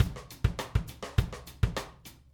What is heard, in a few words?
102 Rim Grooves, Drums; Pop Rock; Moderate Tempo; Pro Tools Essential Loops; Digidesign